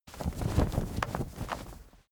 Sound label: flag small flap